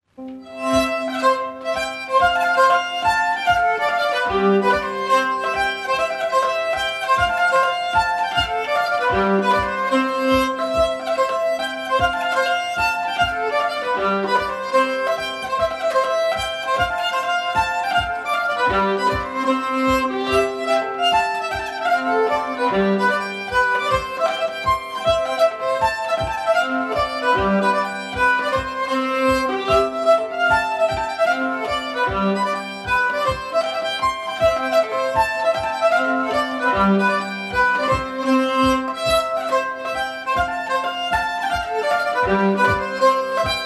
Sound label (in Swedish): Nyckelharpan